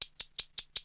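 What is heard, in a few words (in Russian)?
шейкер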